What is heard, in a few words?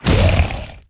Kobra Attack